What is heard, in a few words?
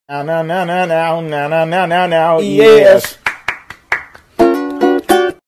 guy ukulele voice